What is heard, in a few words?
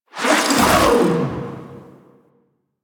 Grapple Travel C; Warframe; The New War; Archon; Nira; Abilities